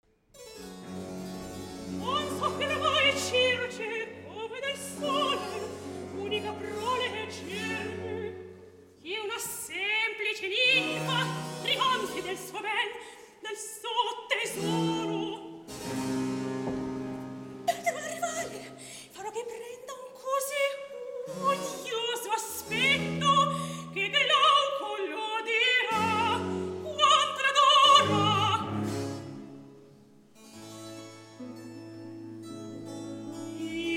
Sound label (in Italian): Recitativo; Non Soffrirà Mai Circe; Polifemo